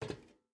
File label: footstep rubber boots metal walk; Rust